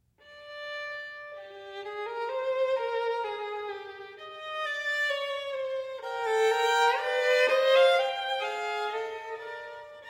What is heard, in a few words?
Sonatas For Violin Solo, Sonata No. 1 in G Minor - II. Fugato; Ysaye; Op. 27